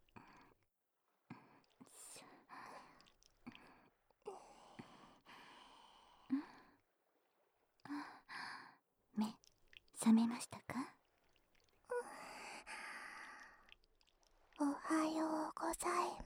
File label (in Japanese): 潮風ゆったり耳舐めダブル手コキ, ASMR